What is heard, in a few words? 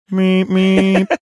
mimicking sound soft train horn